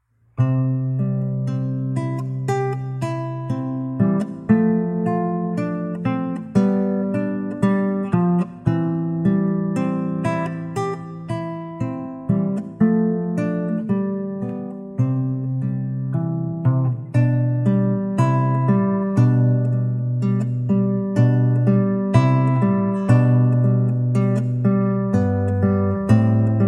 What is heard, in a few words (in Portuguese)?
violao estudo; sor; op35-01